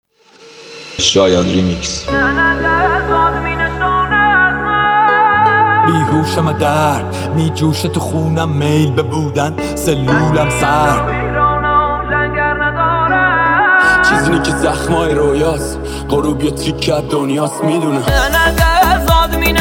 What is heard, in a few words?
remix rap kordi ghamgin